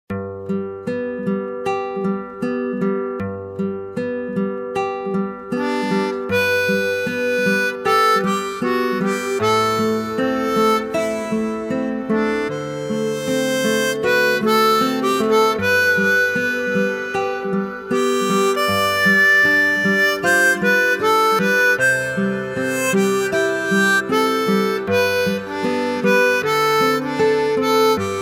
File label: Solo Orchestra